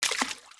FS Water, footsteps

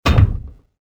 hitech stop, door; movers; doors; Soldier Of Fortune II Double Helix; XBOX

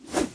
gren whsh, weapons

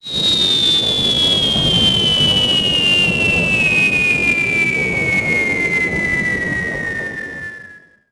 Fly; Splashdown; XBOX; Edge Mnst

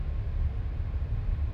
interior ambience, cargoliner; Soldier Of Fortune II Double Helix; XBOX

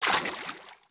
FS Water Deep Wade, footsteps

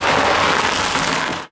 brake, Cars; XBOX

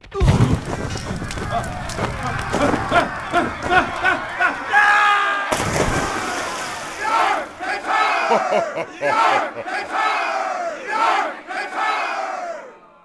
duel outro amb win; Sid Meiers Pirates; XBOX

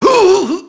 mobster get hit; enemy; Max Payne

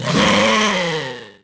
skeleton attack; Pirates Of The Caribbean; DUEL

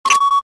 switch; Star Wars Jedi Knight II Jedi Outcast; XBOX; switches

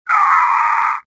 pain; Star Wars Jedi Knight II Jedi Outcast; XBOX; shadow

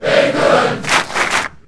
chant england, Crowd; David Beckham Soccer; XBOX

